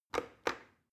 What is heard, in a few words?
plastic button start click regular slow